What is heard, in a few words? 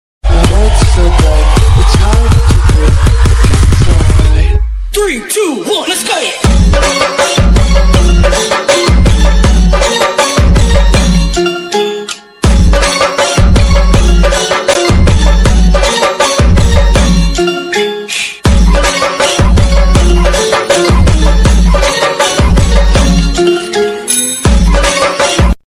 Banjo Mix psy trance